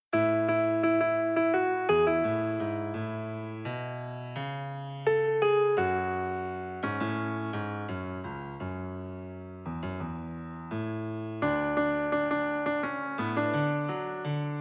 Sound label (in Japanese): ピアノ